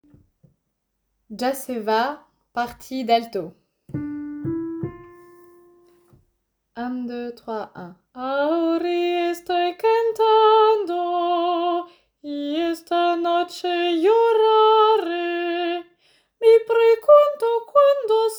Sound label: Ya se ba Alto